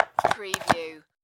Pencil Sharpener

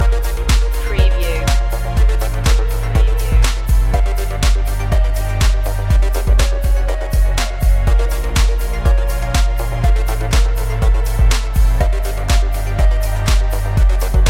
synth dance loop

